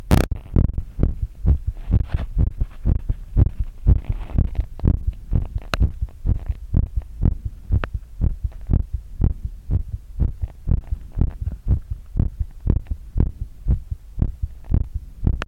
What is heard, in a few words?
real heart beat